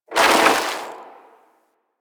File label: Worker Ice Lever Pull; Warframe; Venus Open World; Venus Solaris United